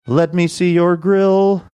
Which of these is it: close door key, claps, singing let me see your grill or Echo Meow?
singing let me see your grill